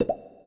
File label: Rotor Left; business scheme beta 2